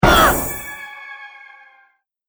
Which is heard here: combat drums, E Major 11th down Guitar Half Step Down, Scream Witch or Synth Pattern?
Scream Witch